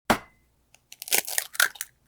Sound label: egg crash